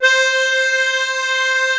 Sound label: tuba loopnote